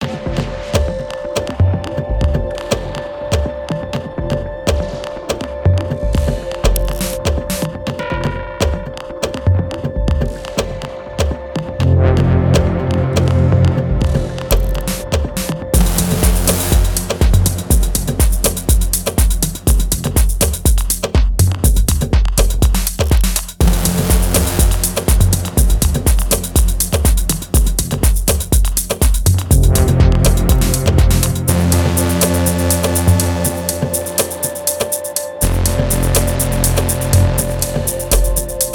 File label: Noises; maschine expansions; indigo dust